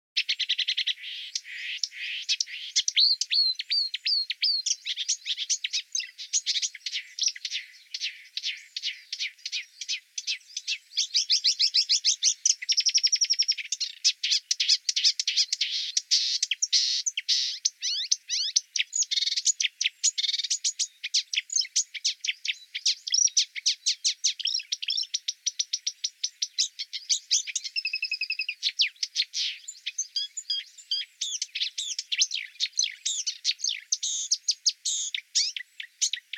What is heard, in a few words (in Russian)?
bolotnaya kamyshevka golos